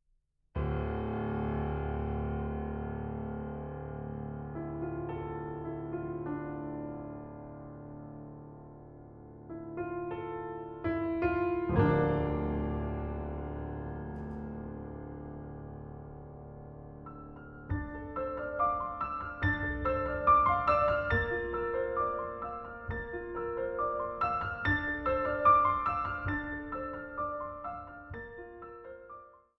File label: Piano